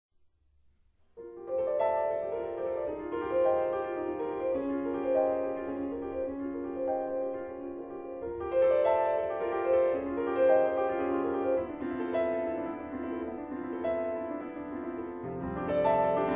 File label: etude doubles notes